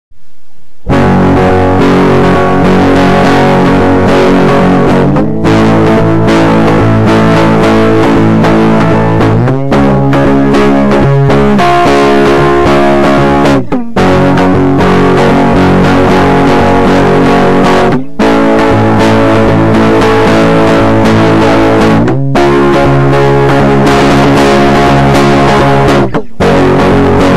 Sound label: steinberger